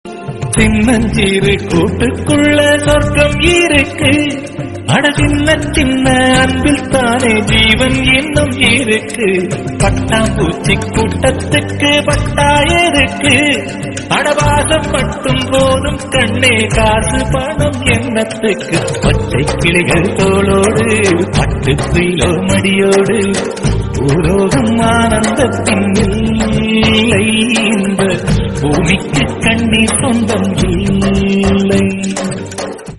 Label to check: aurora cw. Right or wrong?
Wrong